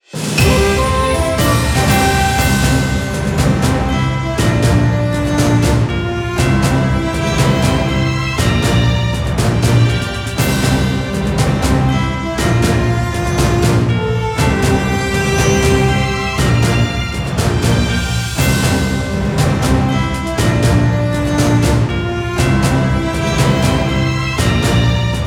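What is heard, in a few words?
jingle battle win; sengoku taiga android ios mobile gamerip 2018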